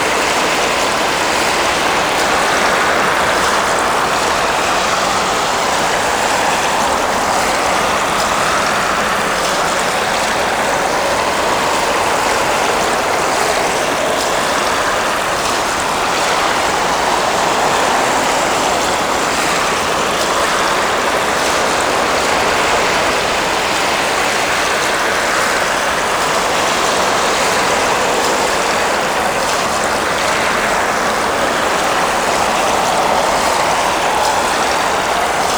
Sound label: Inner Waters Loop; moul